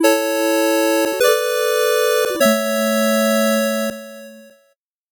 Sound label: 8bit jingle slow